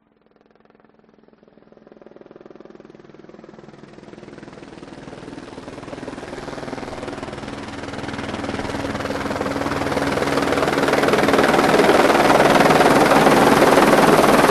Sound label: vehicles helicopter approach from right then over close by as350 b2 squirrel